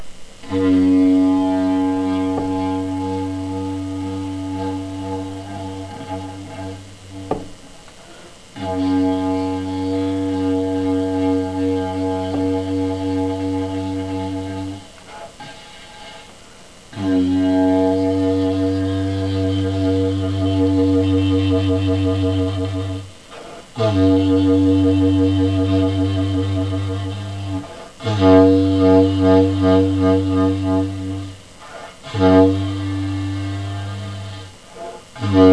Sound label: 3ft pvc didge